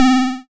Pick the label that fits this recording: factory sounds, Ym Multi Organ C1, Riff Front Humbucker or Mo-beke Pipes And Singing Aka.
Ym Multi Organ C1